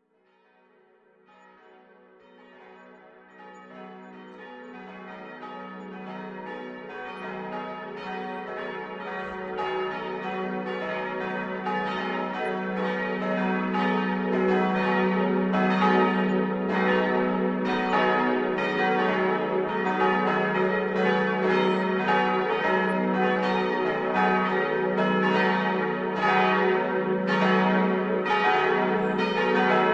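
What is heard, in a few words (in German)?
glockengeläut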